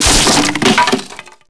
break wood box; breakable

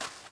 footsteps sand

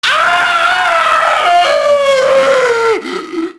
junkie fire death; enemy; Max Payne

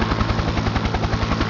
helicopter; Project Gotham Racing

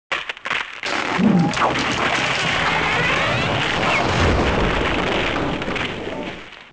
mast fall; shipcharge; Pirates Of The Caribbean

